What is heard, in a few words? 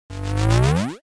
ZIP